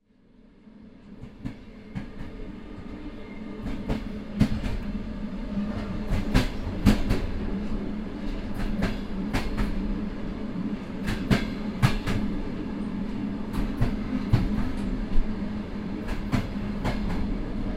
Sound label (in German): Bahn Rumpeln